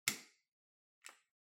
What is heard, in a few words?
Light Switch Wide Panel, Hall Gentle